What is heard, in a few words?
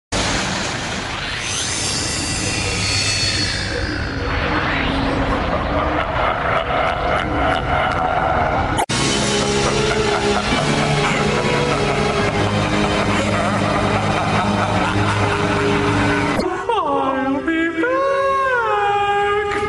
Him laighing